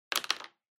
Felt Tip Pen Put Down

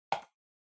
PC-Mouse (Far-R-Hard)

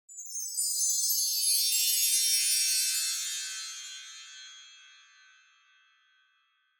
Wind Chimes, Reverb Slow Down Wide

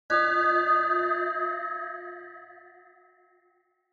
Onoma Negative Reverb; オノマトペ ネガティブ; リバーブ

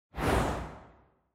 Camera Motion Dry; シーン・カメラモーション

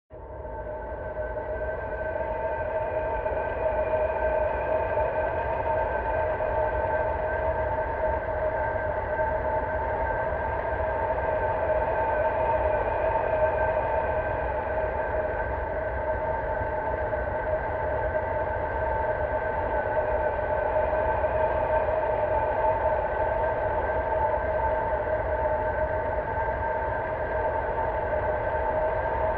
Retro Anime Jet (Low Far)

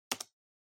PC-Keyboard (Enter-Mid)

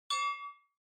OS Sound (Speaker-Notification)

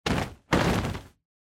Motion Fall Stumble Short

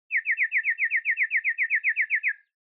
Motion Dizzy Fast Short

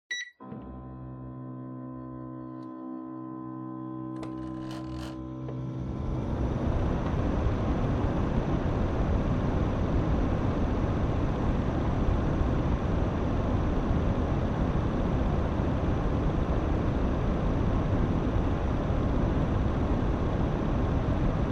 Air Conditioner High

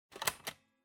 Floppy Disk Drive Insert

